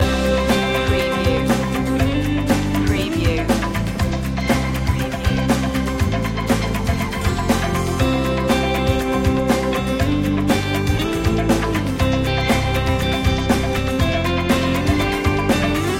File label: corporate fever loop